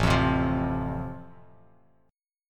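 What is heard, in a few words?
A Minor Sharp 5th, Bass Standard, chord strum; Am#5 strummed; 5,8,10,10; JGuitar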